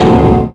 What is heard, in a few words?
iron; Pokemon Solar Light & Lunar Dark 1.0.1